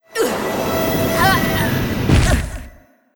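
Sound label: wind spirit death, monster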